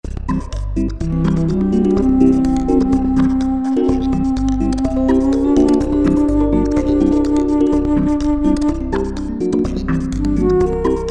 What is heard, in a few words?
Sing